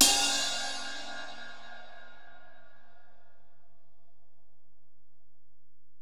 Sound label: Ride, Alesis DM-PRO, Drum Machines